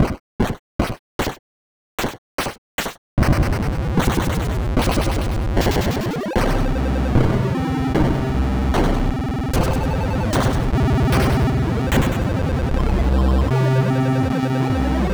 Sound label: song